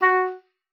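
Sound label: F#4, oboe d'amore; Xsample VOL-7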